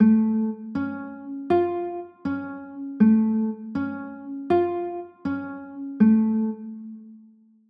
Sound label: d m maj7, chords